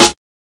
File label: old school sparkly acoustic snare sound f key